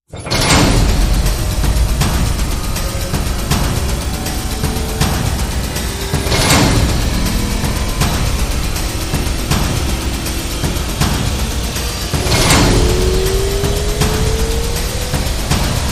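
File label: Rise Rhythmic Attack Speed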